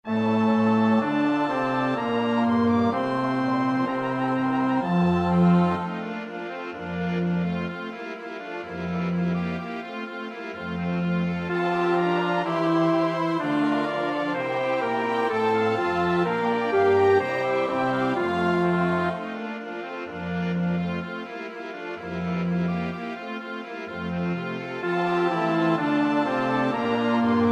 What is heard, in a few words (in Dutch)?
bas; Bach (Johann Sebastiaan); BWV 024; 6 O Gott, du frommer Gott